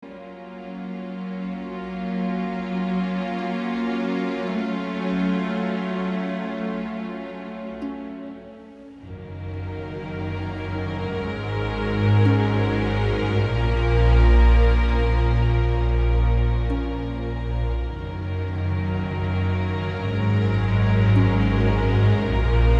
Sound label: Orch